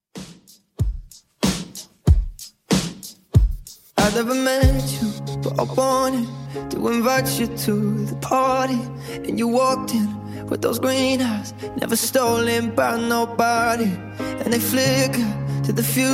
Top40 Redrum 94bpm

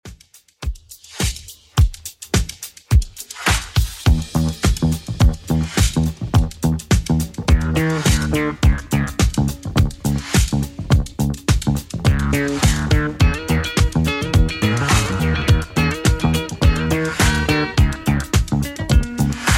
70s Redrum 105 bpm

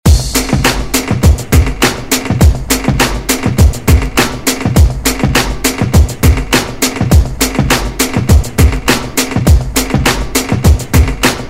70s Ext Redrum

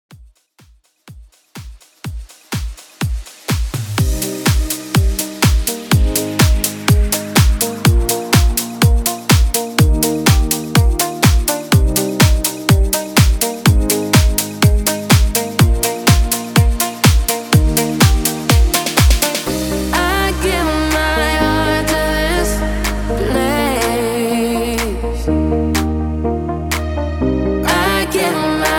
124 BPM

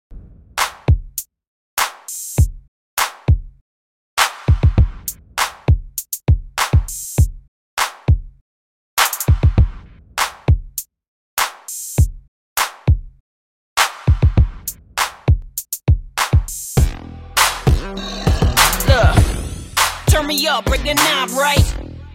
Club Party ReDrum

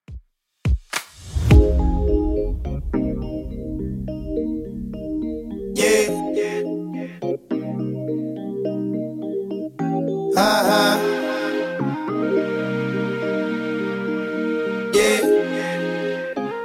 Redrum 105bpm

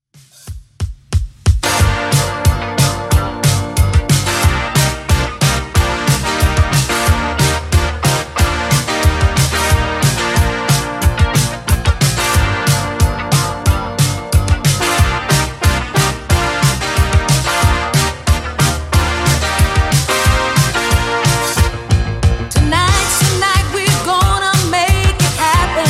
80s 182 bpm

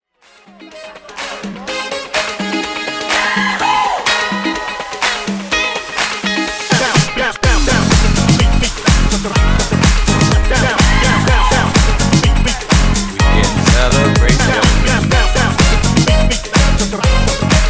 Classic Redrum 125 Bpm

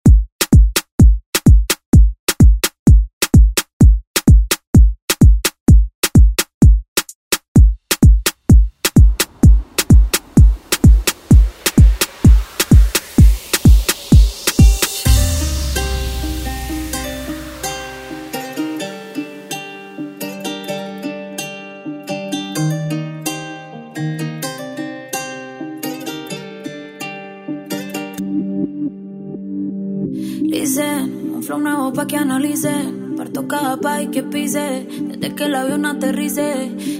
Latin Urban Redrum; Deejay Hit

